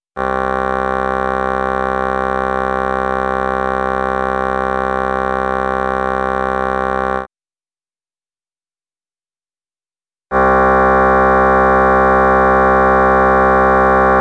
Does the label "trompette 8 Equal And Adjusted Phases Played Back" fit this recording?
Yes